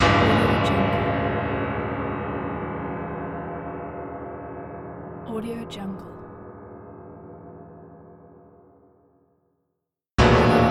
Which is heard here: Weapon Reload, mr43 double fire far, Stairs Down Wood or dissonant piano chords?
dissonant piano chords